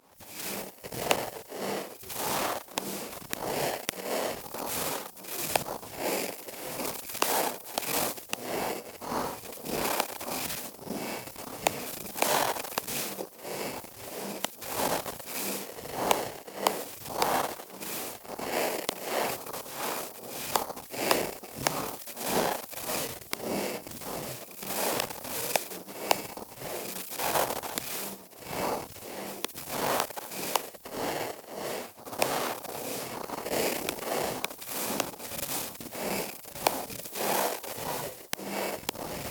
Cm72 Zone Ambience

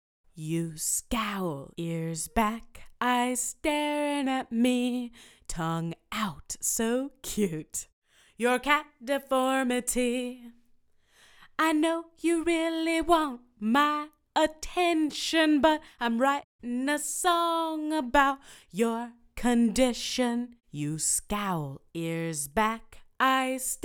Vocals

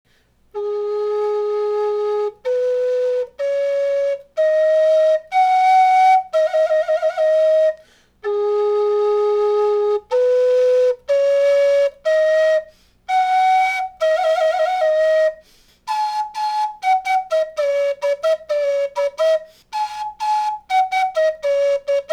Wooden Flute Melody

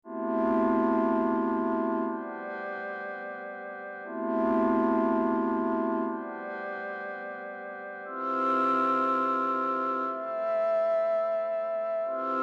Am120 Rogue Chords

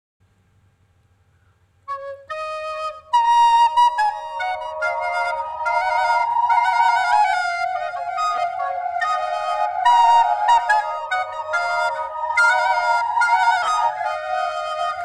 Unknown Folk

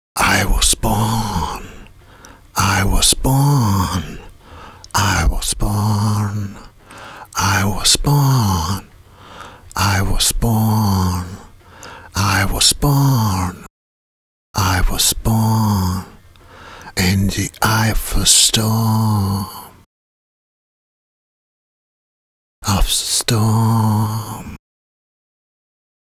vox; i was born

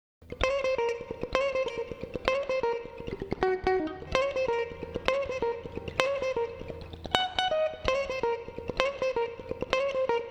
PIGEON FUNK

